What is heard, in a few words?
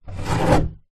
household window wood frame old fashioned close